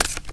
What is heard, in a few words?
card move